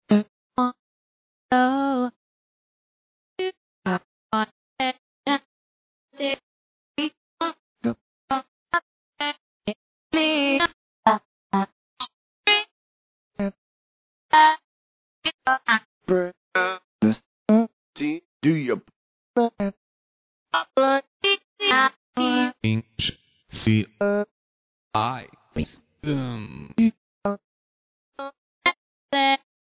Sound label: vox vocal cuts; Trance music production; G-Stomper